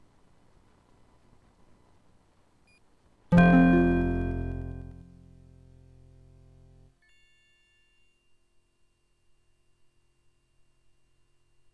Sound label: OPL3LPT intro; TIE Fighter